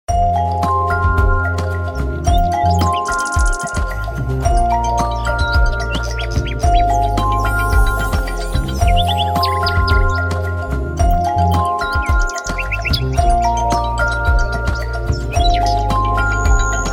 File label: funny birds; Vodafone P600; ringtones